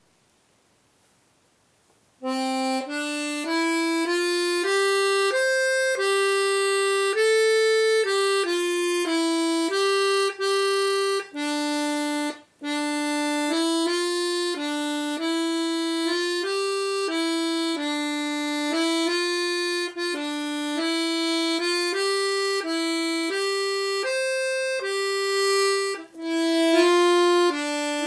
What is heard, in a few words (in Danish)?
dejlig er den himmel bla spillet pa harmonika